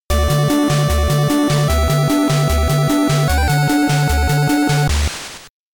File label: Game Over; NES